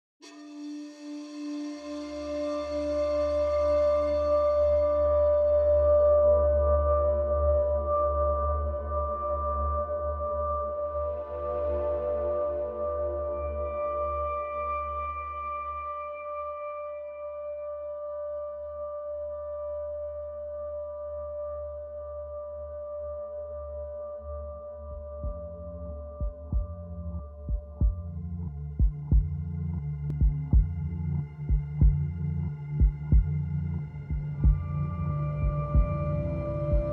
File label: Atmosphere Score